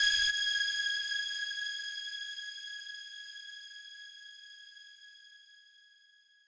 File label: lead